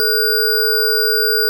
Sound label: bronze ratio chord; Bronze Sign Chord